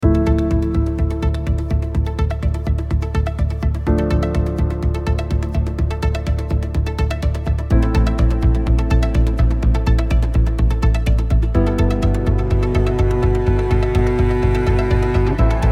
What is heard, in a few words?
music